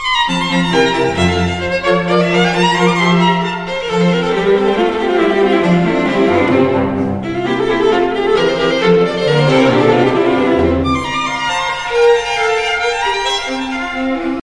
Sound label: fine tune ringtone, nokia ringtones